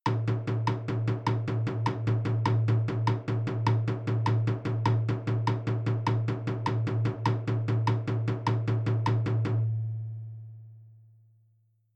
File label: Triplets and Quarter Notes